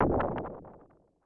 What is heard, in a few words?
asteroid hit; adarkroom